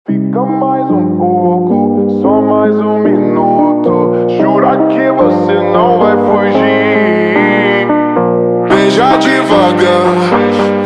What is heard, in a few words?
Montagem super Slowed